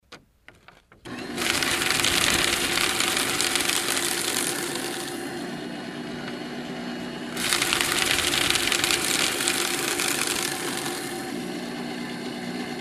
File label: Shredder